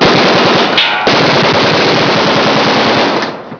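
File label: machine gun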